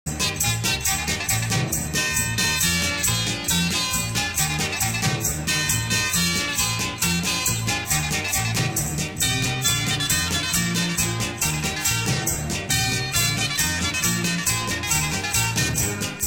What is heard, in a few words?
guitars